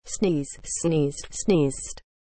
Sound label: sneeze